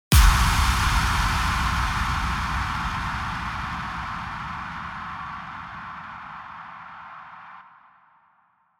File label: Impact